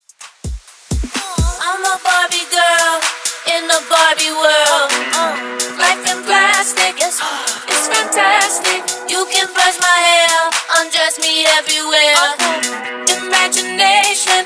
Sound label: Club Dance In Outro 128 BPM